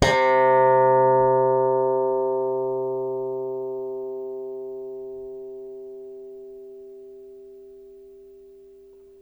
SLAP BASS, Electric Basses

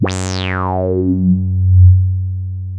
brass F#2; CMP - Analogon; FLORGAN

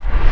TURBO KICK

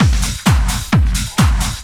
drum 130 techno; Techno Trance Essentials

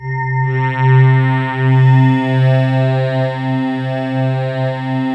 STATION, KORG; WS KORG#1

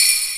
Tam Again, EMU Proteus-2000

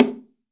BONGO, STEREO BONGO, Cuba

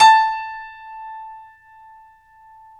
PNO, Piano Layer, Grnd Pad

